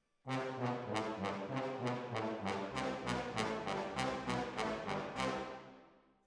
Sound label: Hall, Jazz for piano